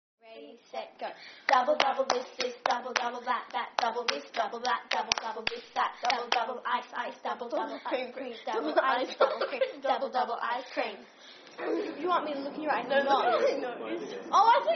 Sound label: Clapping, Double Double This This Double Double That That